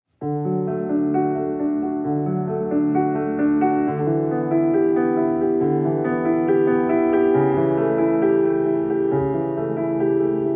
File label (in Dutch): zang en piano; Johann Sebastian Bach